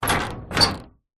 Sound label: office filing cabinet old metal handle turn